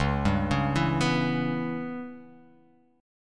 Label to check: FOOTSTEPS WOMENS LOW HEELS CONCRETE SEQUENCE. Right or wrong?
Wrong